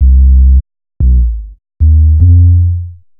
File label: Bass, Futurist Drum & Bass